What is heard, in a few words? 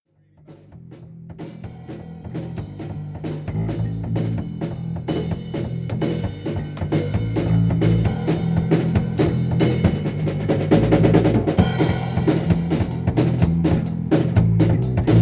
Bass part